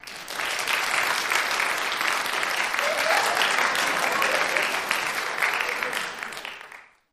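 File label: Applause Laughter Small Crowd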